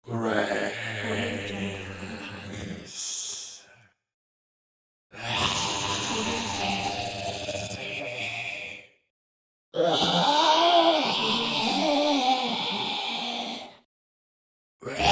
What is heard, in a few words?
zombies